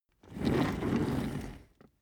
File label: office chair trundle fast long